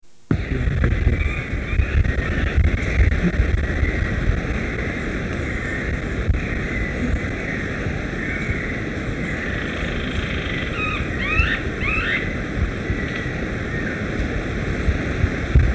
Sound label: Cichlocolaptes mazarbarnetti song after playback